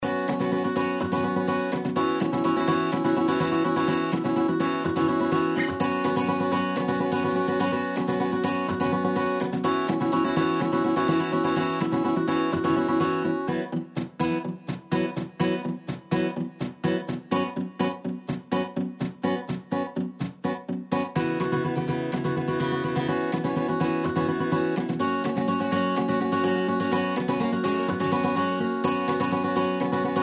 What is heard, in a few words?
clean guitar sample